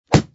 Kick; kickin it; bobby wasabi academy

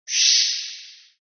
swish out

